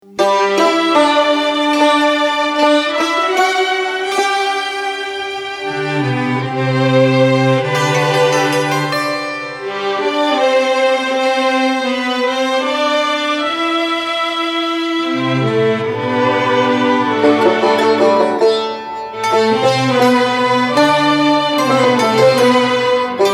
Orchestral; Mahoor

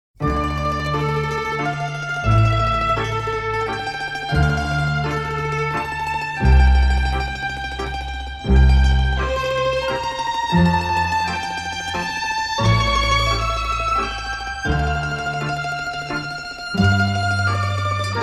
Noori (Orchestra & Mandolin)

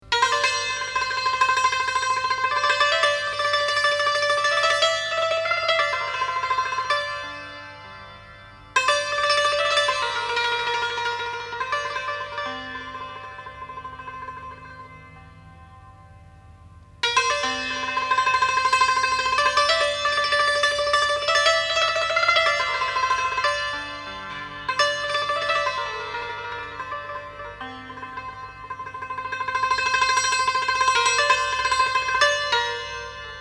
Taknavaziye Santoor (Bayat Tork Fourod Be Shour)

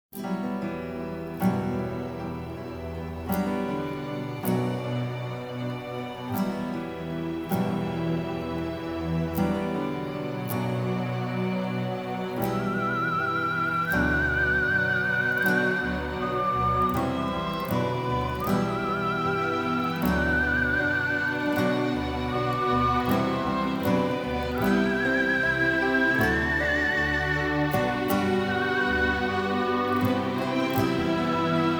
Instrumental

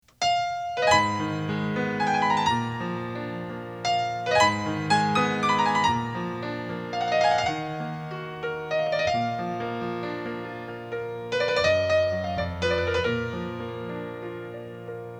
Overtour Of (Piano Solo)

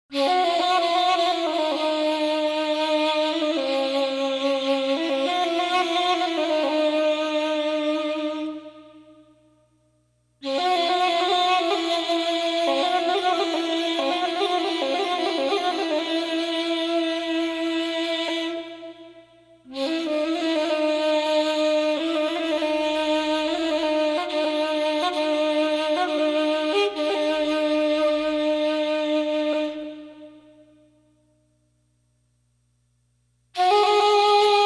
Dastgahe Shoor Instrumental

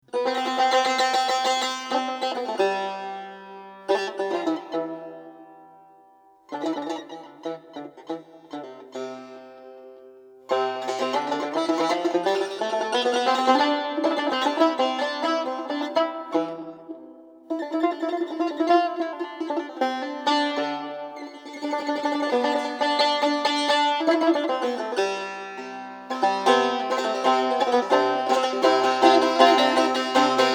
Tar Solo

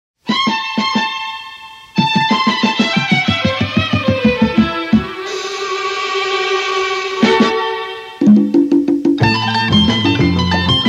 Instrumental

